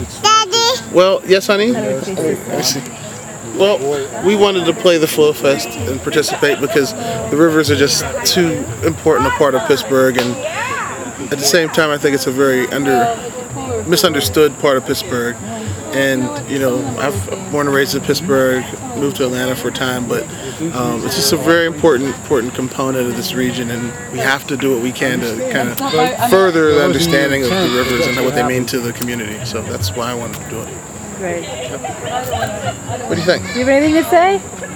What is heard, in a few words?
trombone; FlowFest2007